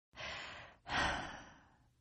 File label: Sigh Female; Exhaling; Voice; Partners In Rhyme